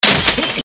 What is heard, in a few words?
crash light metal